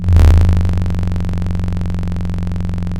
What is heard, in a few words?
Sound of a drum roll